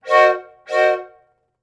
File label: Whistle Steam; Blastwave FX